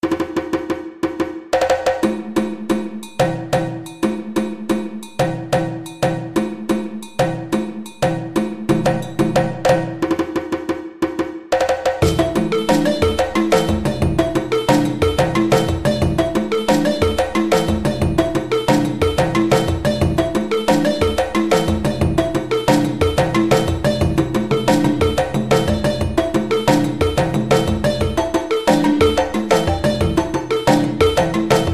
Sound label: Fladon Arrangement 180 bpm, djembe